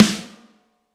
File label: Snare 14x6 Brass hard